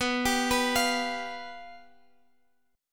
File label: G 7th B; G7/B arpeggiated